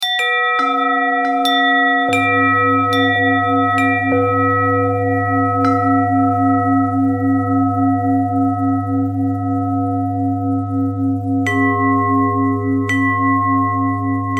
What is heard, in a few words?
bell thread